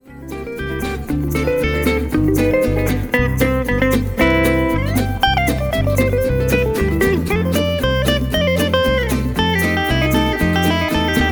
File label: Guitar Ride